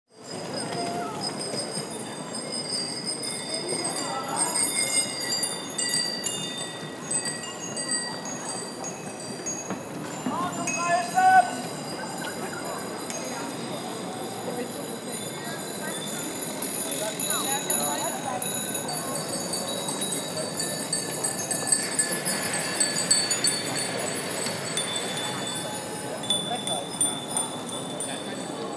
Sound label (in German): autofreie Stadt